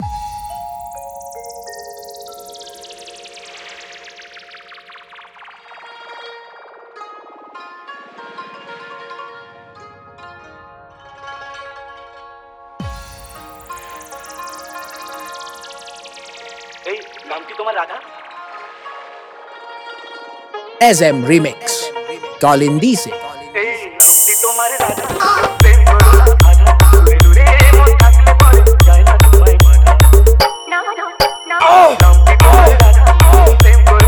Holi Festival Special New Medinipur Style Dancing Humming Mix